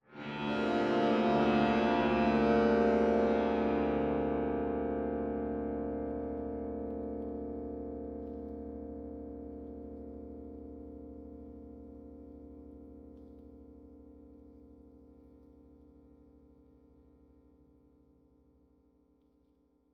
drone, electric, Cuba, DI, position5, strong, hopeful, temperamental; Eiravaein Sound; Yvaine